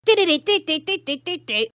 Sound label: imitating phone ringtone